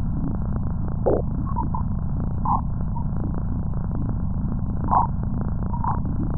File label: voyager termination shock